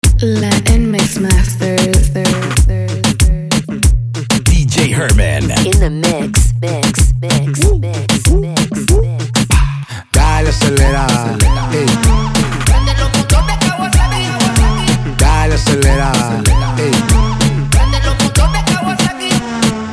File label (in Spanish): REGGAETON MIX